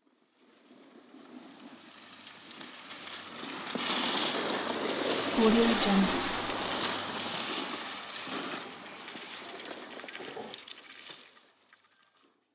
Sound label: snowboard and skiing downhill